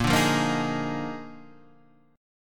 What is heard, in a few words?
Eb Major 7th Suspended 2nd Suspended 4th Bb